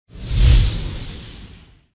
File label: woosh